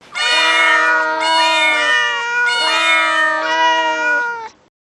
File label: ringtone mega cats